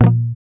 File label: GUI A#, GUIT2 AC; Best Service Gigapack 2